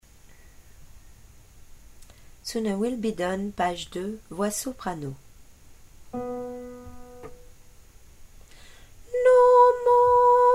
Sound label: soprano